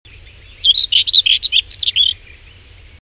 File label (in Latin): Sylvia communis